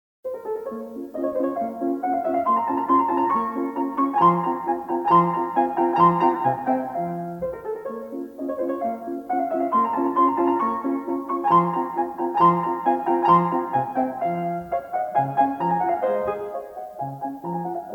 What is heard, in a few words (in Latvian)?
turku marss no klavieru sonates la maz; v a mocarts